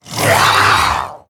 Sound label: Zombie Monster Die; Pain Train